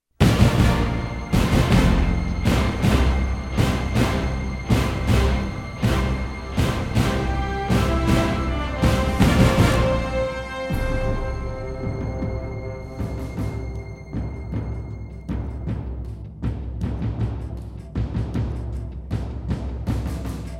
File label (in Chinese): 豪迈的背景音乐